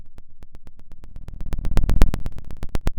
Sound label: Sound of a fire crackling